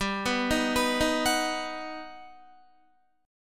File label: G Augmented Major 7th, Guitar; G+M7 arpeggiated; JGuitar